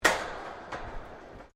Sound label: METAL IMPACT CLANK; Metal Sound Effects; Metal (Sound Effects:Materials)